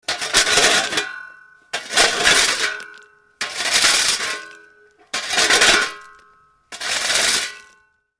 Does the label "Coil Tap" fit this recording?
No